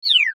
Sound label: Transition Bright Slide Descend; Multimedia; Blastwave